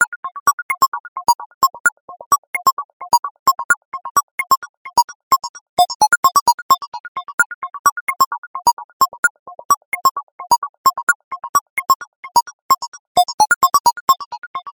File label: 130 Beep